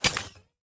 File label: zombie step; smite; ahpuch skin10